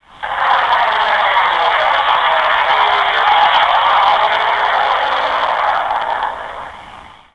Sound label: engine start; mosquito